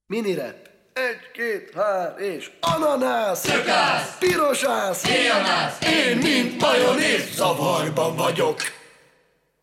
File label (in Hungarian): Mini rap